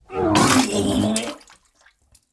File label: vomit